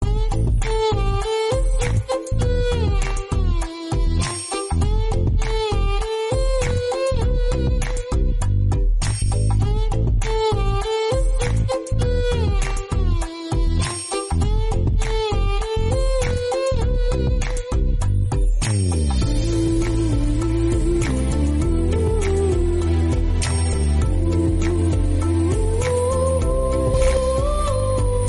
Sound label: background music